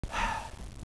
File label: SIGH